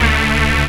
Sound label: HIT